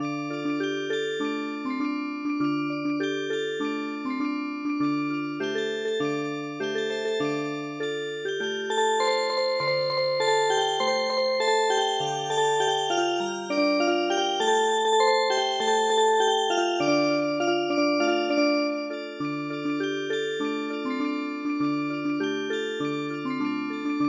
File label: chonko bushi Music Box